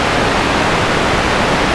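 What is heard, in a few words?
waterfall up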